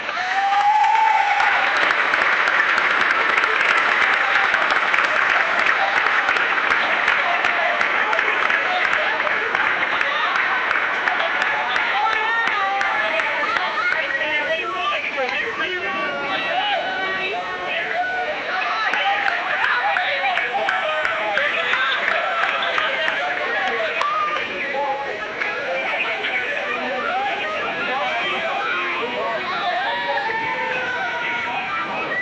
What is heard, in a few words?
scene for a wedding, wedding